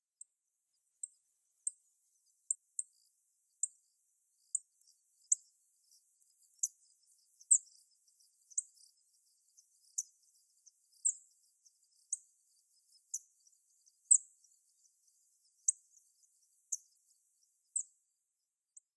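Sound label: Chestnut-eared Bunting; hooaka; nasumachi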